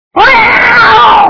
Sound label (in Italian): gatto arrabbiato; HelloTom; AppInventor